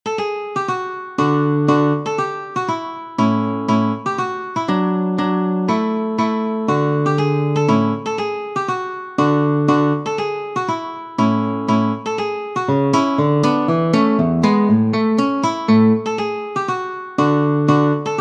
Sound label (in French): Andante Grazioso, guitare; Ferdinando Carulli; Op. 241 Méthode pour la guitare